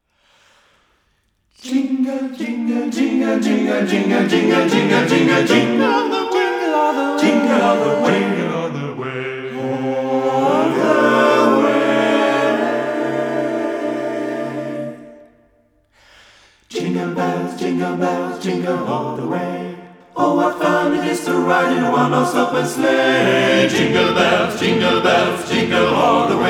jingle bells

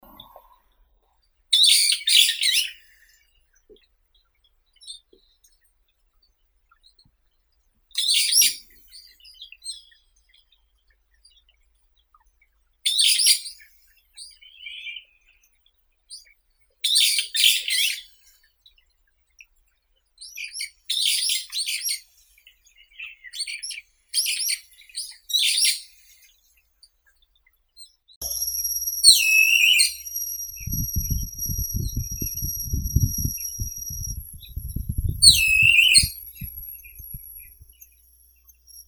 bulbul, Microscelis amaurotis; bulbul korotkopalii

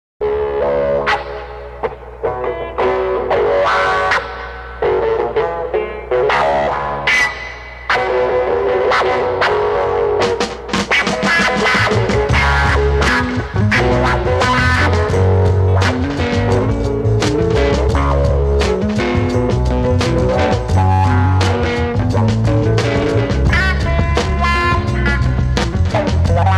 wah wah instr

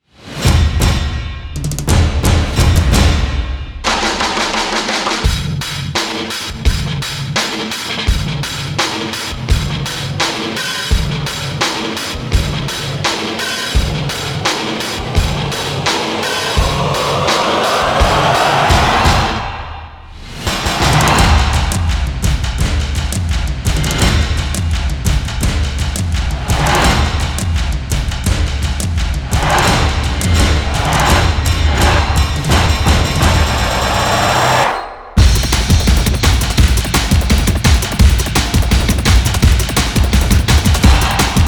drums fx

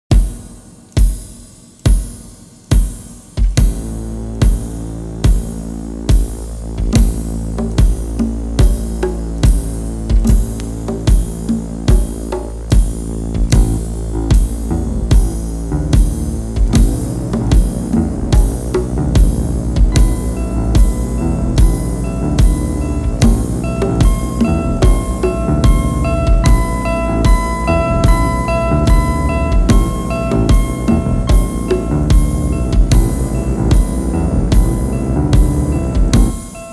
suspense drums

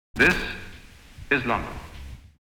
fx and scratches

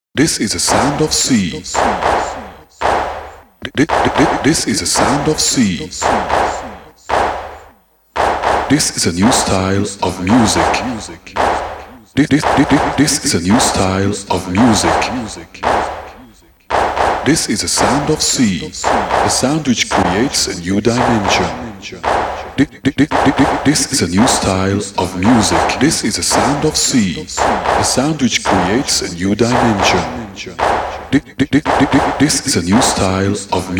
the sound of c acapella

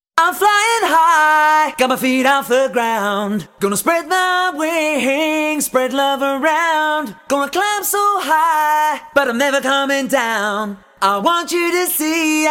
accapella

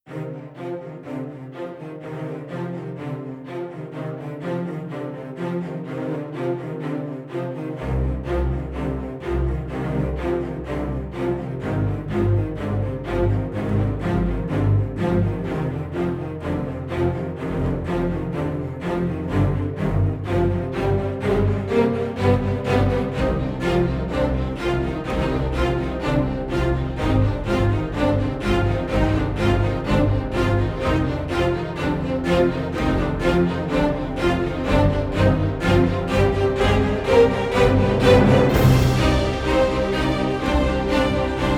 no choir

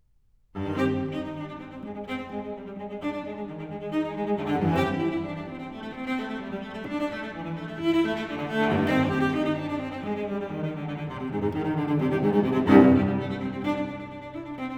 suite for solo cello

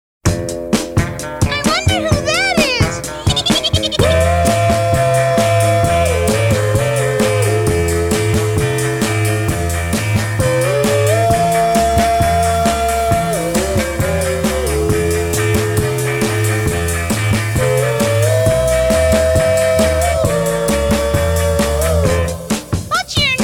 instrumental version

